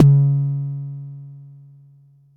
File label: Bassdrum; Jomox Xbase09